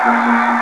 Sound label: loss; usa